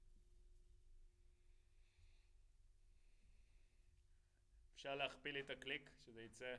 SNR Top

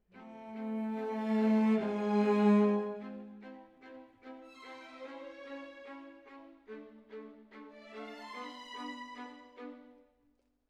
Cello Solo

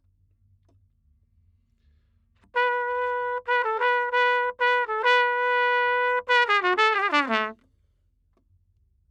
Trumpet

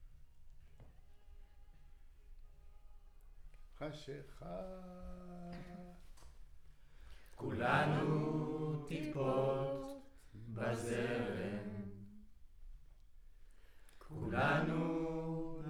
Center u47, Choir

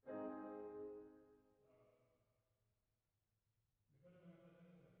Piano

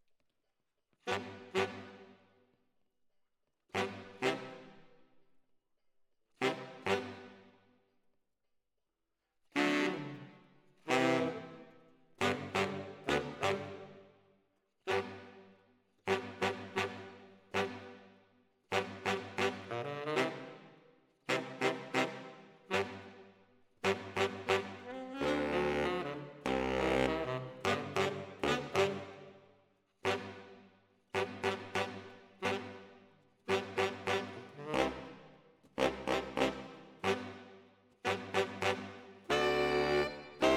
ORTF SCHOEPS, piano

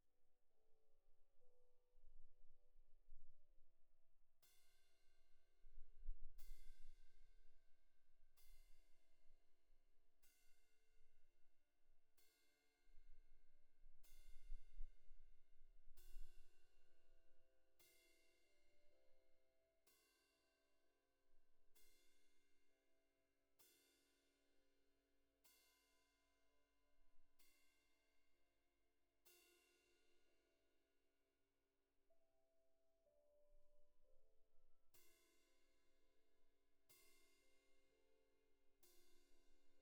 Cymb2 Main

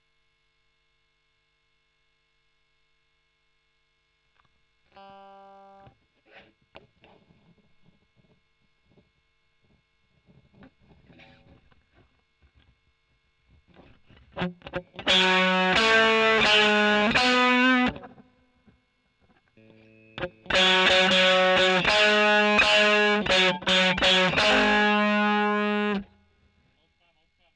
E. GTR Gefell OD

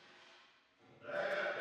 Drums Verb

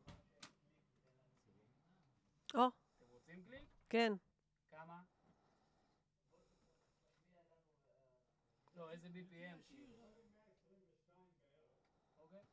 VOC GUIDE

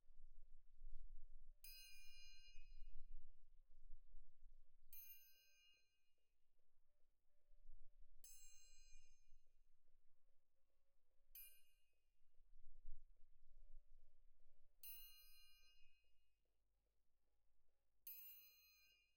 Perc Main

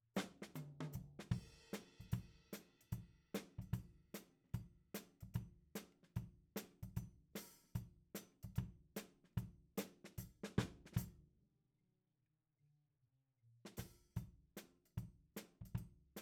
Tom

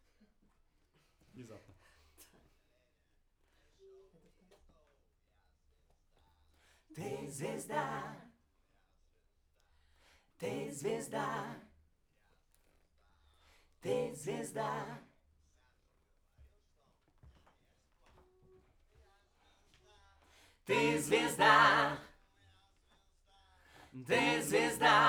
Backs SCHOEPS

